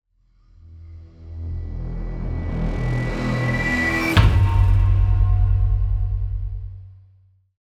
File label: Riser